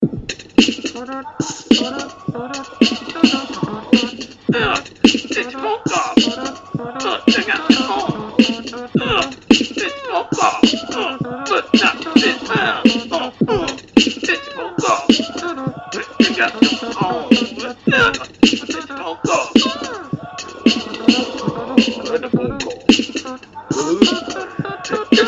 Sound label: beatbox impersonation odd trumpety sound